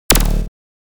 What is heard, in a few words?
Insert coin SE; Shooting Love 2007 Original Soundtrack